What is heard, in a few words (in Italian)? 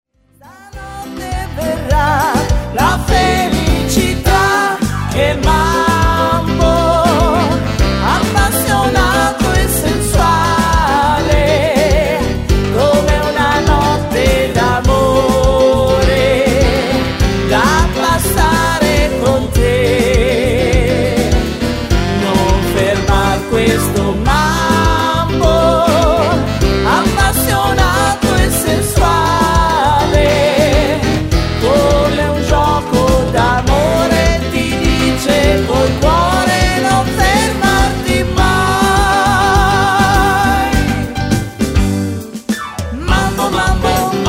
mambo mambo